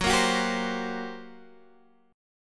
G Minor Major 7th Double Flat 5th Gb

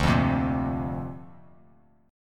D-Minor 7th Sharp 5th D Bass Standard, chord

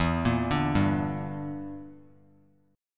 E Minor 7th Flat 5th E; arpeggiated

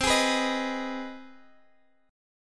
C Minor Major 7th Sharp 5th C; CmM7#5; strummed

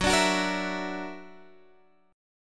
F sharp Augmented 7th, Guitar, Drop D; x,9,12,11,11,12

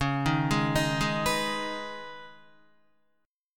Db 7th Suspended 2nd Db, down, Guitar; JGuitar

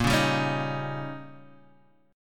Eb 7th

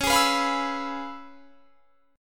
Db 7th Sharp 9th Db down, chord shape; Db7#9 strummed; JGuitar

